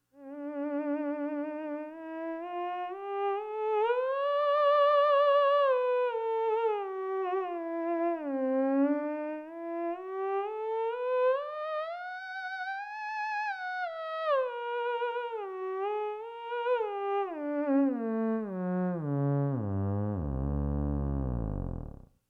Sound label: ews resonator, theremin